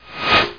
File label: swish; OIDSupdater1.3